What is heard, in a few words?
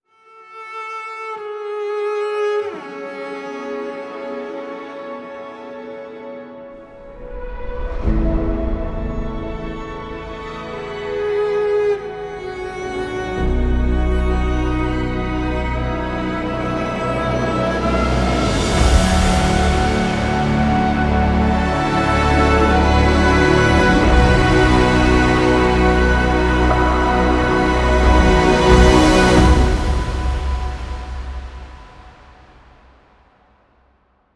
Opening Short; minecraft frozen planet ii education edition original soundtrack 2022